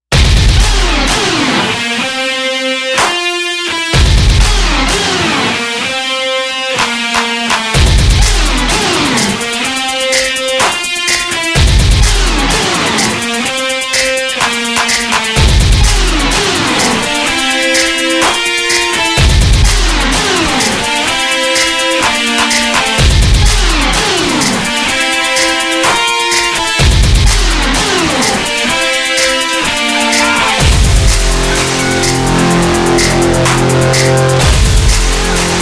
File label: sleighbells